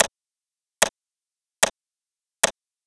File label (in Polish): Bomba